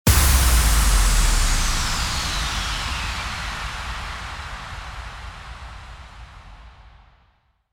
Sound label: IMPACT